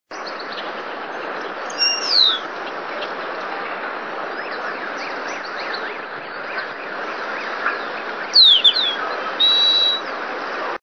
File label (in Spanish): voz varillero ala amarilla